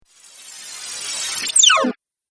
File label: Laser Hiss Video Game